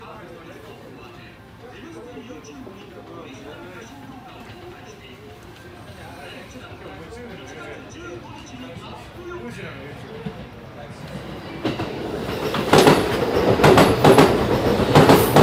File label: drinking alley sounds